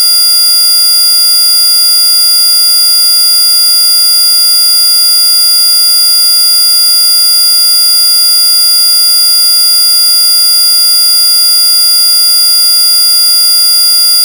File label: sample background music